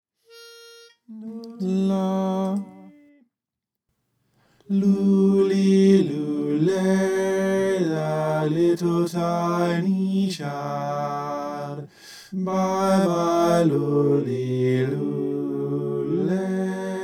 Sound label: bass